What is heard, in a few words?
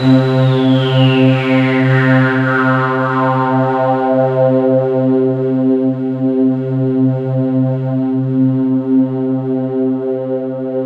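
ETHERAL, Kurzweil K2000; BASIC-KW2000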